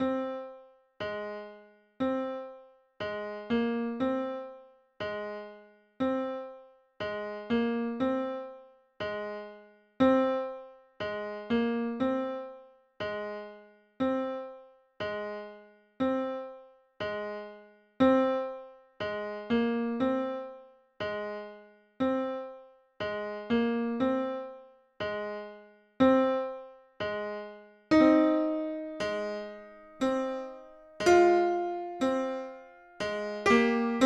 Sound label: Splendid Piano; SimCity 2000